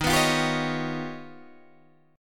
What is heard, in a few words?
D sharp Augmented 9th D sharp Guitar Open D